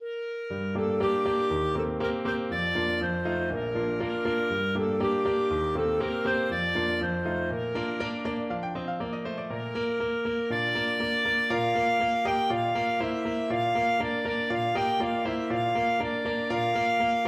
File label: janitscharen, clarinet